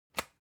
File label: object electronics usb port metallic out hard